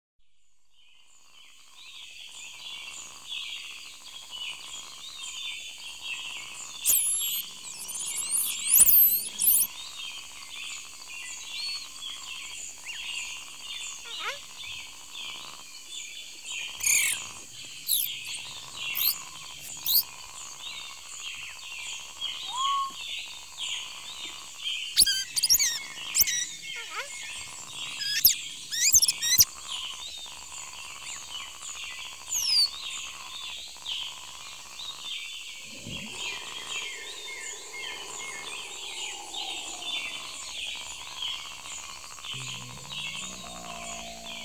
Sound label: Forest Wildlife